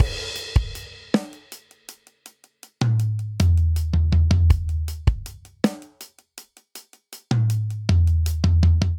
Straight Six Rock Intro 6/8 80 bpm; MIDI Clips; Ableton; Drum Booth

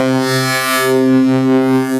Junky Digits, Synth Lead; Ableton; Glitch and Wash

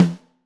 Snare Top

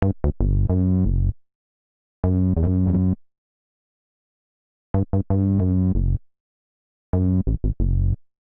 F# Minor 87bpm, Bassline; MIDI Clips; Slow Pull Back; Ableton; Factory Packs; Chop and Swing

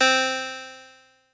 Crumar Clavichord, String Machines; Crumar Orchestrator; Ableton; Factory Packs; Retro Synths

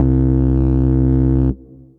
Low Ride, Synth Bass; Ableton; Factory Packs; Beat Tools

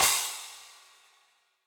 Crash FX17o14, Cymbal; Drum Hits; Standard Hits; Cop Style; Session Drums Studio

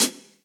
Hihat Closed Reso Classic; Drum Hits; Standard Hits; Ableton; Factory Packs; Session Drums Club

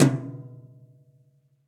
Tom Brush Mid BrushSizzle; Drum Hits; Ableton; Factory Packs; Session Drums Club; MPE Hits

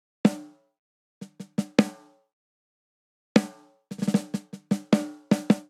Grunge Rock Snare Only 77 bpm; 4 4; MIDI Clips; Ableton; Factory Packs; Drum Booth

